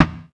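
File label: exterior backfire, Vehicle; NASCAR Thunder 2004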